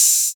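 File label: 808 open hi hat; Goldbaby Tape808 & Tape909; The Tape 808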